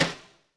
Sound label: Brush Swirl Short; YAMAHA RM1X